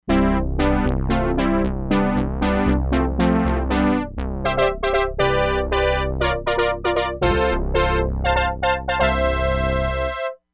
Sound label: FAST BRASS, Crumar Bit 99; Factory Programs